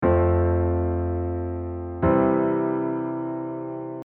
chord cadence

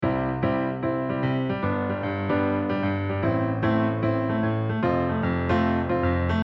shuffle

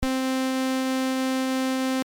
Main Lead lfo

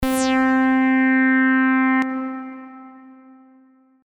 reverb

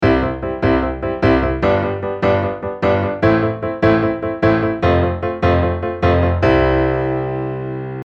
8 beat

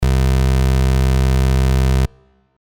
funk bass osc

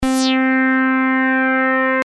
dist pre

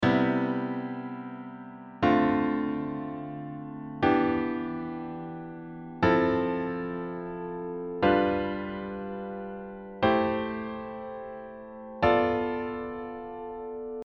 Diatonic 7th Easy 2nd

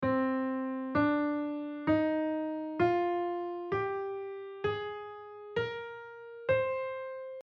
C minor

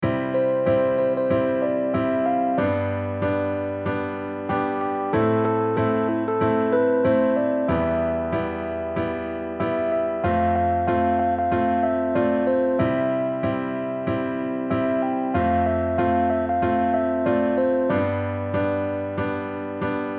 hikigatari rhythm

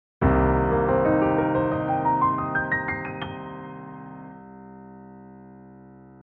ARP Cmin7

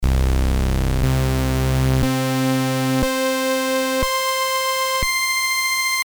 kbd

